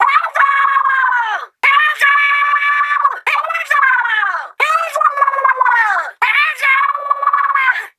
Cheers - Go!; Grand Fest Tricolor

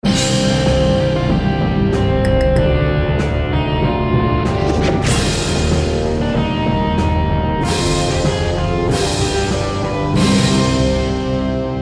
New deathcam music; Secret Ponchos

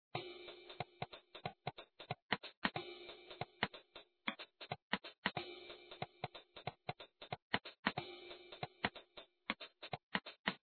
Drums Layer; Mini Game; Papa's Donuteria; Android, iOS, online

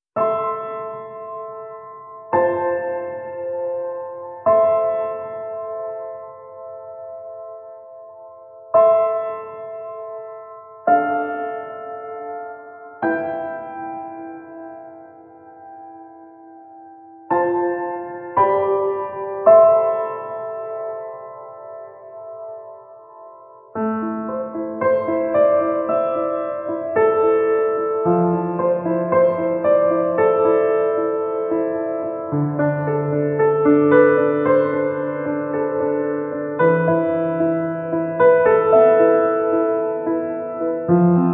Sad Piano; dead end job ios linux macos ps4 switch windows xbox one gamerip 2019

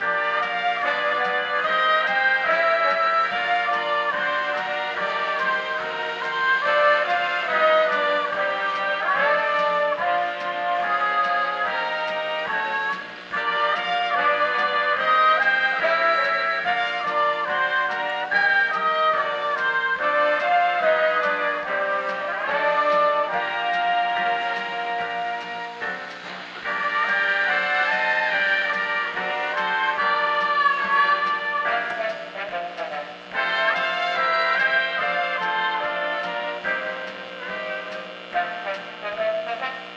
Radio Song; blair witch volume i rustin parr

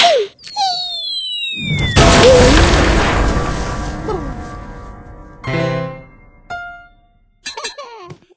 Head poke fall

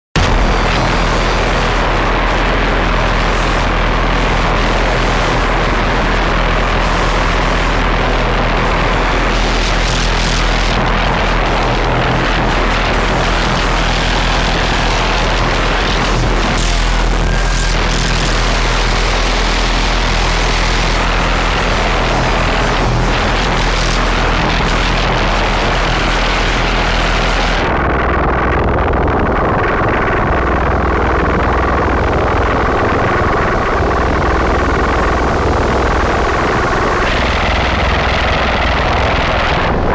Noise; tiny bunny full soundtrack 2019

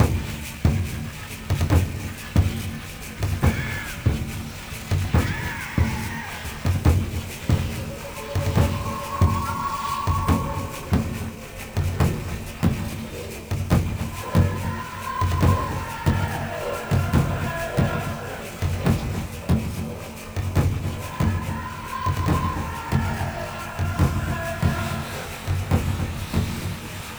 Boss Music; blair witch volume i rustin parr

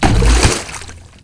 Tubers Hit

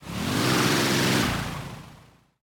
Charge engine

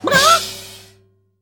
chicken splat; Ringling Bros. and Barnum & Bailey; Wii

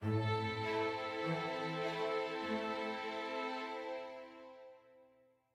adventure med end; Quivr; PS4 Windows gamerip 2016

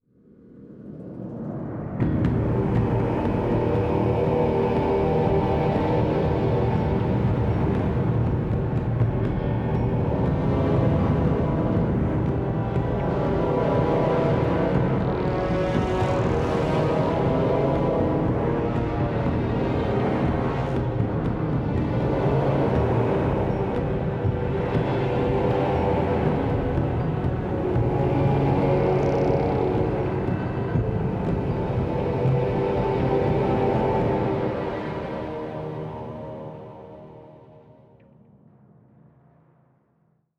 Tension

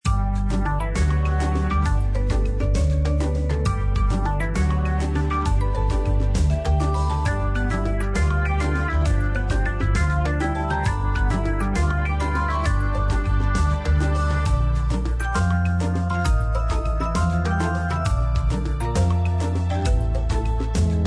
Menu Music

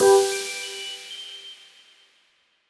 Retry Jingle; Friday Night Funkin' Soft Mod

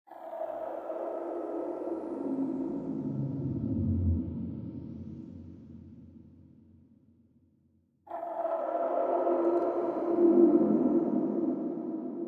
creppy ambience; mechs mercs black talons linux macos windows gamerip 2015

